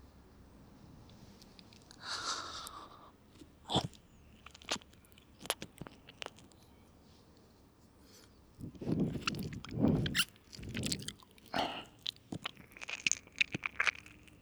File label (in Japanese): 耳舐め のみ 右; 同棲中の蜂宮葉月がお布団に潜りこんでくるシチュエーションボイス＆耳舐めASMR